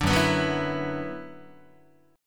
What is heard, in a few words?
Gb 7th Suspended 4th Sharp 5th Gb Guitar; strummed; JGuitar